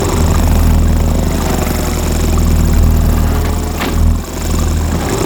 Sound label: helicopter loop; LoL; corki